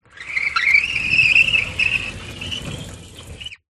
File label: household window thin garage sliding glass squeaky